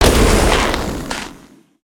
Snowman Smash; destructibles

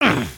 Get Hit; creatures organic

